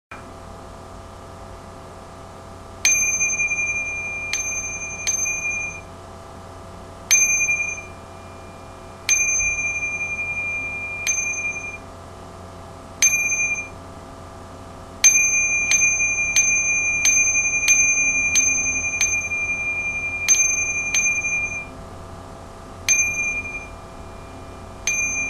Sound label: perc